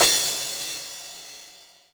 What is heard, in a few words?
CYMBAL, Yamaha RM 50